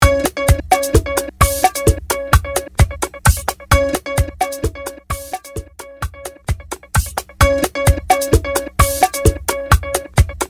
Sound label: Intro Outro Break 130BPM; Dj Emigma